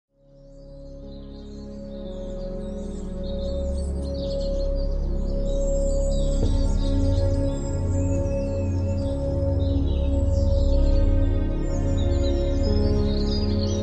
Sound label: The Healing Bowls